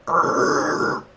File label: roar vap0r